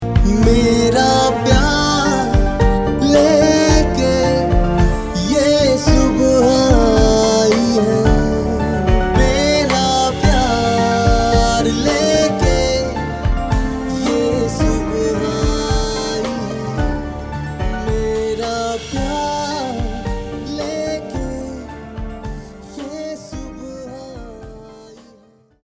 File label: GOODMORNING HINDI MALE